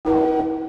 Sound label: Single cycle Sine Wave